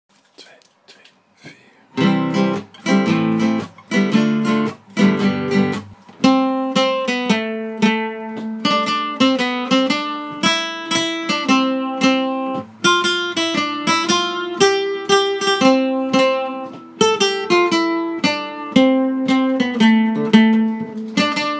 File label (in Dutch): melodie